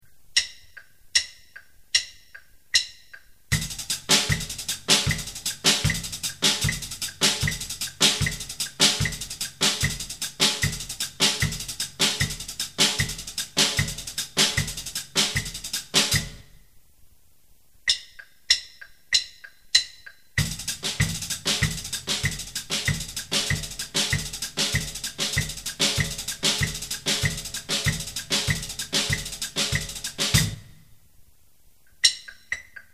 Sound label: 5 stroke